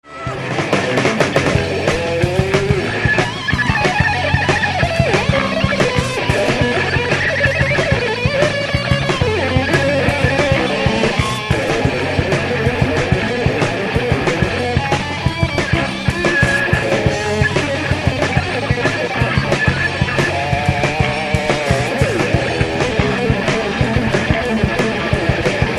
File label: guitar